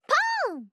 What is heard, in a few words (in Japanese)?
ぽーん！